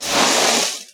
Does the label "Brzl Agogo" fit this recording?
No